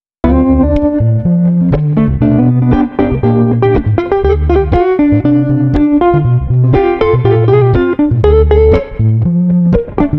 Street Guitar Bass Jazzy 120

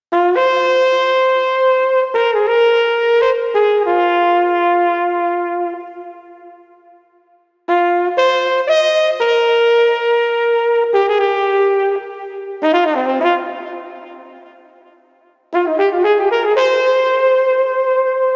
Street Flugelhorn Soaring 120

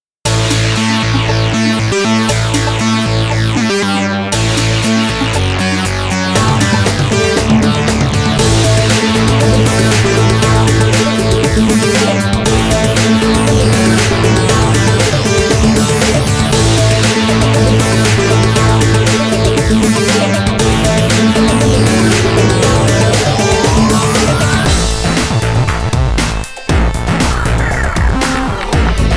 Bit Crushed 118

